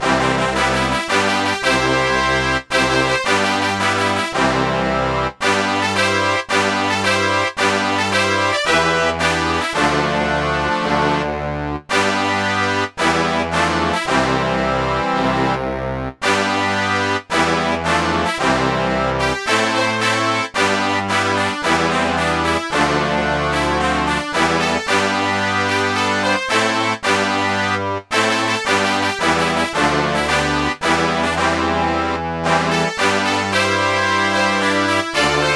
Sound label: National Anthem; Samo ku waar